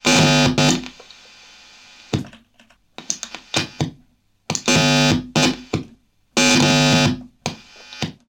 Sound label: musical guitar electric plug into amp; fork media